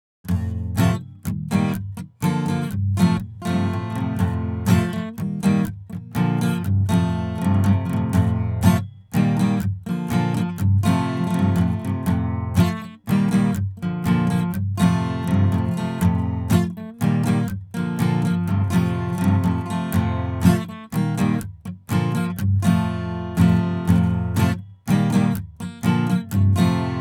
little guitar sample

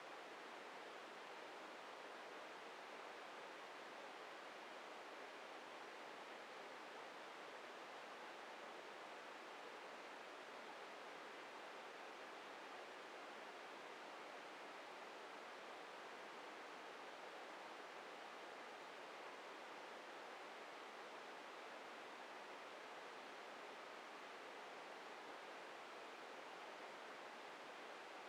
sinewaves

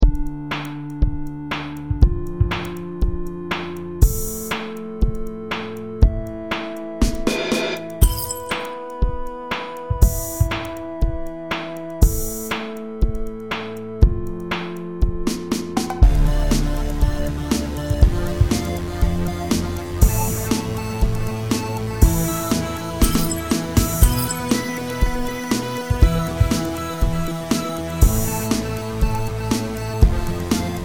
Dm 69 Synth Groove 120bpm

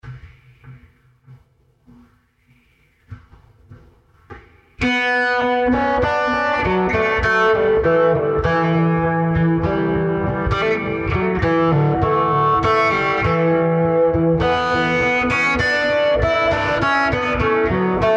Em100 Groove; Joans Groove

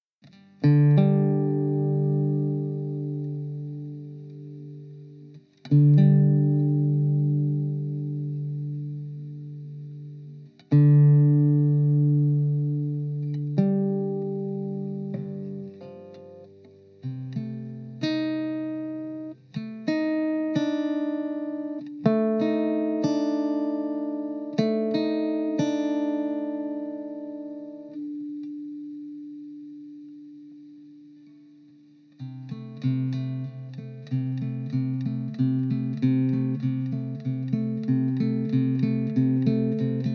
Gm 95 strings keys

